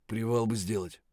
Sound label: tired, voice; Escape from Tarkov